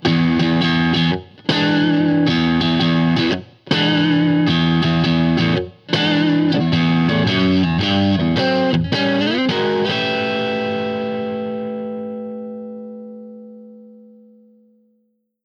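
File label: Blues Guitar; Ending; Pro Tools Essential Loops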